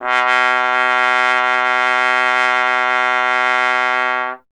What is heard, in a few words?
Trombone B 0; Miroslav Vitous; Solo Instruments